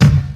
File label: smooth kick sound c sharp key